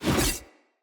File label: Axe Whoosh Heavy; Weapons; Enemy; Axes; Glades Axe